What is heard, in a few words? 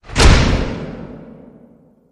Hit FX Throw the Switch